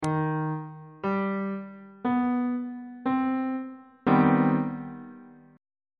b sharp minor major 7th 1st inversion on bass clef